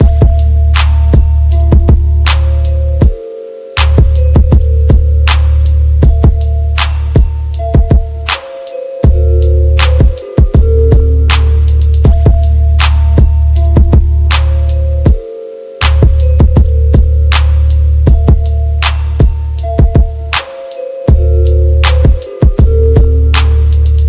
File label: drip car